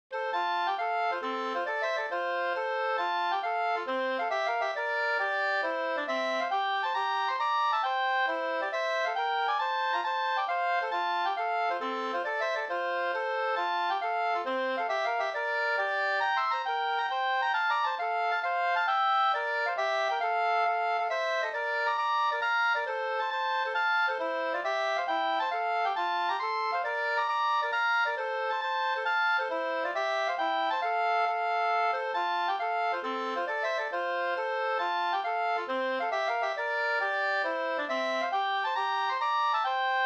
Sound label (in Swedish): hambopolska